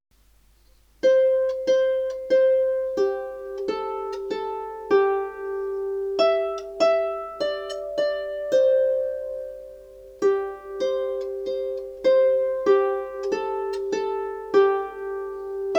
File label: Old MacDonald had a Farm, harp